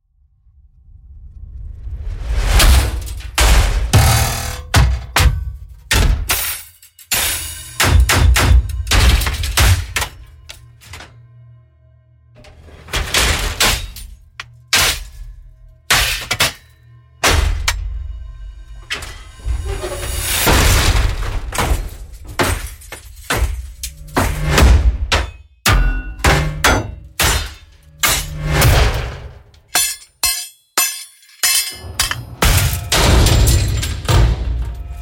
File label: Impacts; SoundBits; Just Impacts Extension II